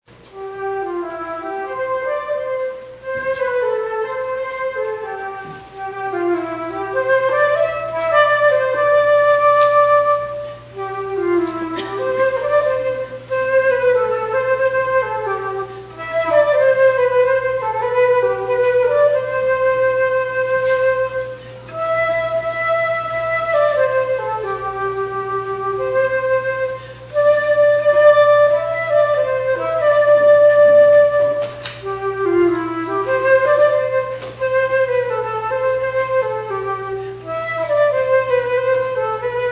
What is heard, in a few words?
flute solo